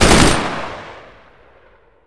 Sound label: machine gun